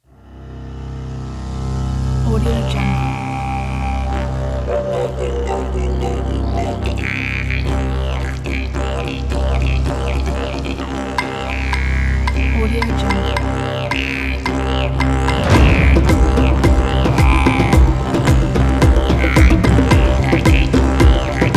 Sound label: dreamtime didgeridoo